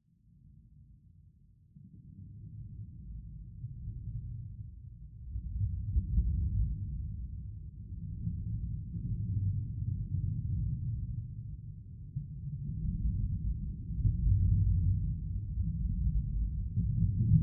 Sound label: nature water underwater ambience rumbling